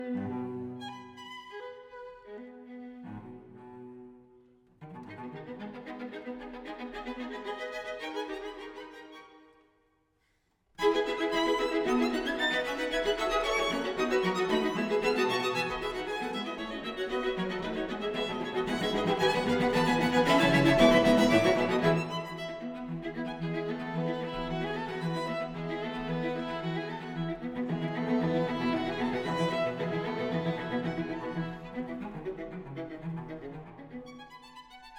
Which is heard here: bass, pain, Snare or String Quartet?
String Quartet